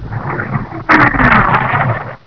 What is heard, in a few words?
FIRE